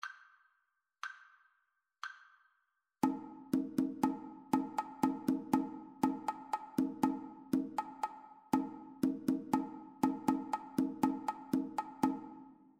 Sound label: two part rhythmic dictation